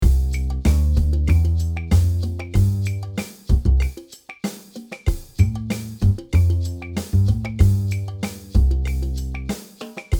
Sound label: Mix Waves PuigTec 100Hz 10kHz; EQP